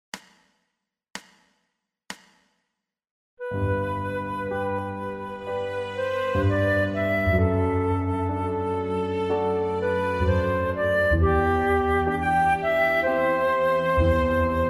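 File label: G play flute reference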